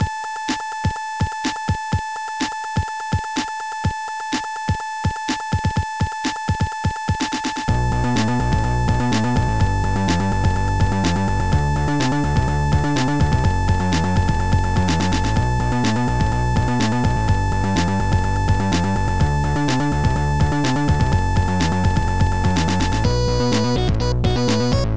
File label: gameboy sound; Amiga FD Inside; quadracomposer